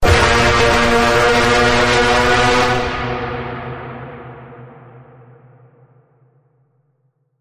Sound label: Full Bend, A sharp